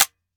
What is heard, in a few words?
fire first; viper